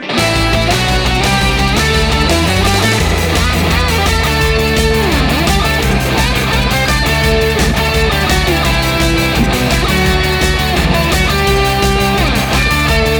Victory Rush BGM; pachislot ashitano joe 2

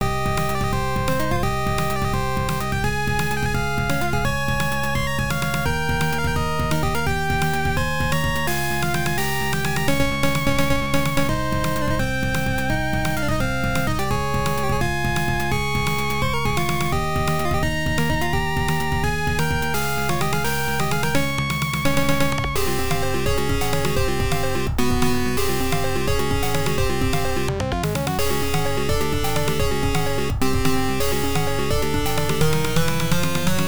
Stage 7 BGM; Fire Scramble; Garudius 95; PC-9801

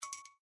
Feed Discovered; Quirky

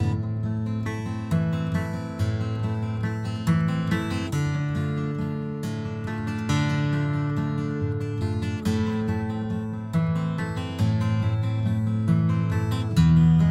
Campfire Guitar; Delver

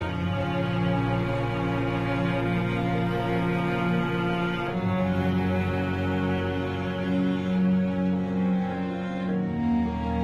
loop Sad

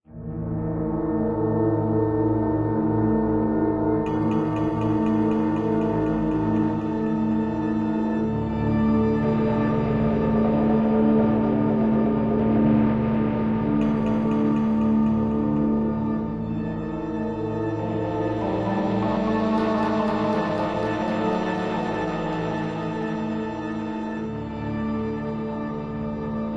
Dungeoneering Floor Track

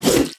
ultimate attack impact; Idle Champions of the Forgotten Realms

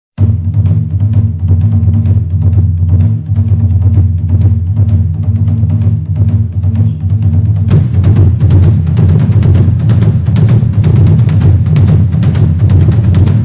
battleground bgm; Disdain for the World; Longcheng Ltd; Android

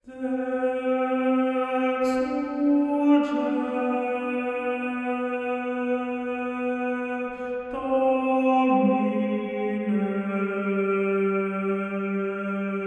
Choir; That Dragon, Cancer

